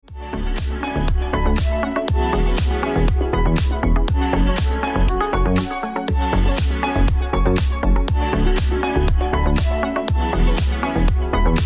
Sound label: Ringtone